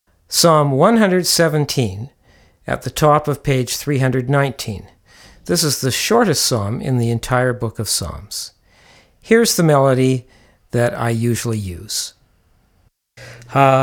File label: Psalm 117; Hallel